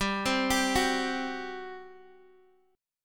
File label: G Major 7th Suspended 4th, G, down, Guitar, DADGAD